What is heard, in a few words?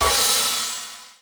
Pickup Magic; Pickups